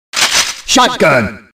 shotgun; metal slug 6

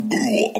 loop march

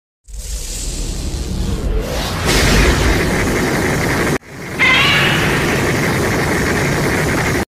atomic breath; godzilla final wars

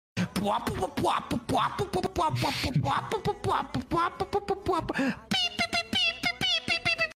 pua pua pua puaaa pipipi

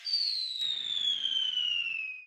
falling

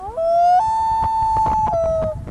loon call wail

